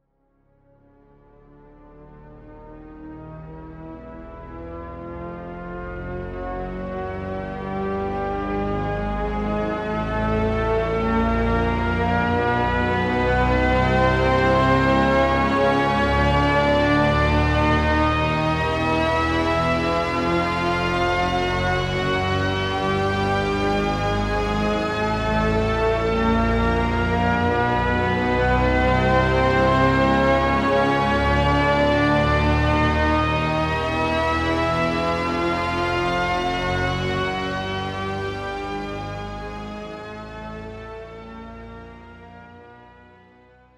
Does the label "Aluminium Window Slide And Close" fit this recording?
No